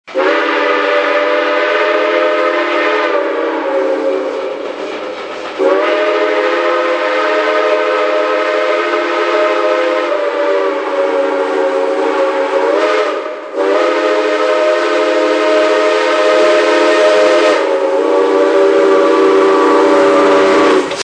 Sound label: horn